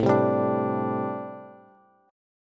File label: A Minor 11th A Bass Standard, Chord; Am11 strummed; JGuitar